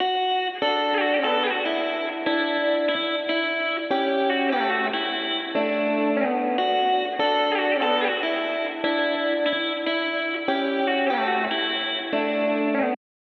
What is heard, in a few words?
Guitar, 146bpm; Remix Stems